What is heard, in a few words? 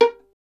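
STR violin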